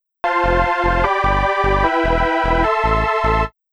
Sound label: F G Em Am